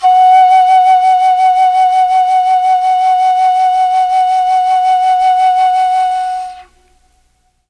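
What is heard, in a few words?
F#3 chamberlin flute, Mellotron; Mike Pinder - Mellotron Archive CD; CHMBLN FLUTE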